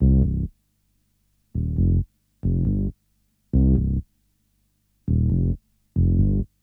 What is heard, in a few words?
Melody Loop - 136 BPM C Min Bass; Melodies; Loop Stems; Cymatics; Oracle Sample Pack; Oracle Various Melody Loop 11